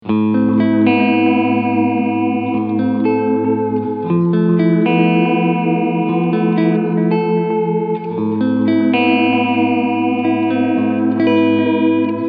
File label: Echo Dream 2; Death by Audio